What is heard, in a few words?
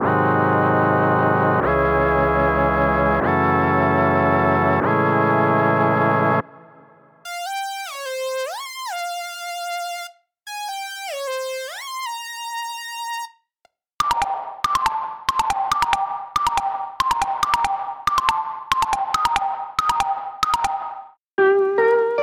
Trap